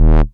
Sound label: Bass Multi Rev D#; Vengeance Samples